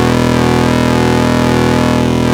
ARP 2600 E2, synthesizer; Hollywood Edge - Giorgio Moroder Rare Synthesizer Collection

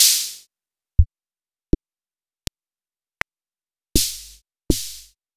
Acetone Rhythm Master

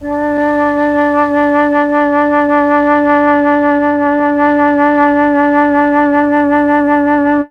flute; ILIO; Propeller Island Legendary M400

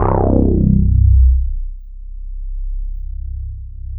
Synths BASS

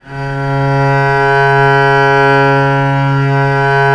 C#2, VIOLE, Historical Instruments; USB Soundscan vol.23

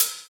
Open Hhat, Hihat; Equipped Music; Loungin House

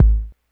kick, Hardcore Breakbeat; Big Fish Audio; Hardcore Breakbeat Action

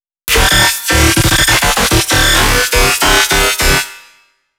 C loop, bass

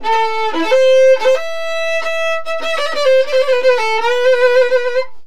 VIOLIN, Balkanish Traditions

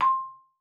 marimba C6; Xsample VOL-4

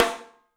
MARCH, 3D Drums; 3D Sound; MARCH XTREM

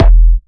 Kick E sure

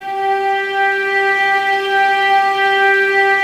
STR VAS G, Violas, No Vib; Miroslav Vitous

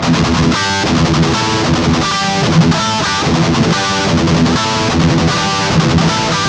Guitar Licks

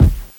kick, NES DPCM

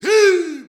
BRAZIL, World Voices

